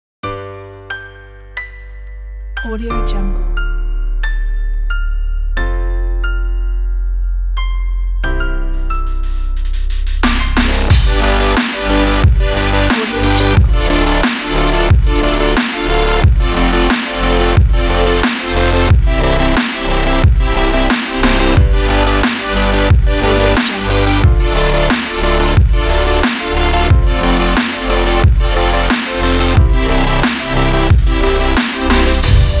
fly energy future bass

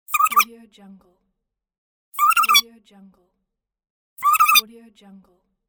cartoon bird call

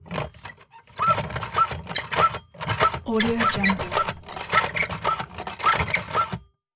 tobacco cutting machine antique crank

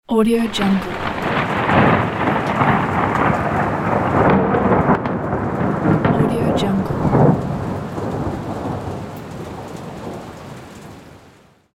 lighting strike

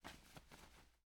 clothes handling

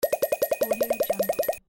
points

